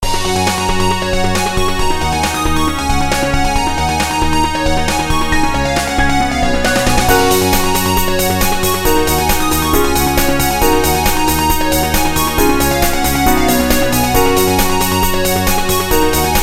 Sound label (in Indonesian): Nada Dering Nokia Jadul Swimming